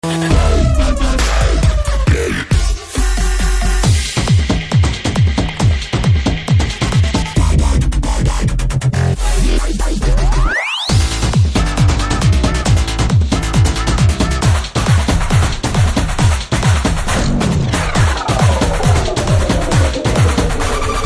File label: DJ Ringtone; Rowdy Boys